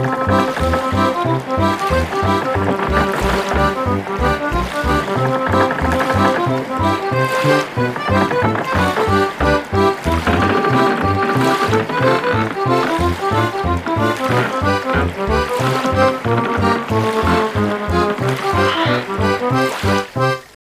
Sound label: cinematic pirate boat; Theme Park